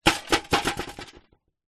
household bucket metal old rusty set down concrete; zapsplat